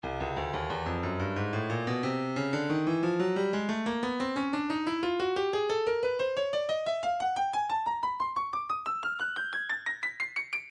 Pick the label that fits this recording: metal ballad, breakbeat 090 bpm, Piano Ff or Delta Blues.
breakbeat 090 bpm